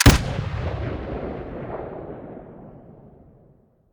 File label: gp25 shoot stereo; STALKER; IWP